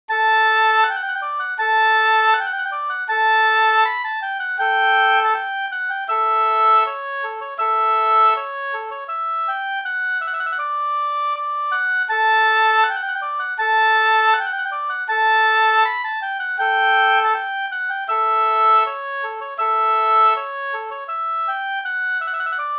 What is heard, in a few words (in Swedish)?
brudmarsch; Sarna brudmarsch